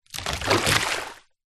foley wood stick large movement drag in puddle water; zapsplat